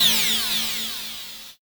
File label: run